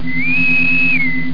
pfeifen; startrek-helm-spot

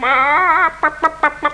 huhn; Little Red Riding Hood; Koch; Storybook

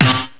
schepper

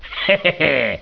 lachen

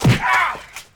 bande; Ski Alpin 2005

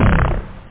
furz; Bass

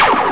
Kanone

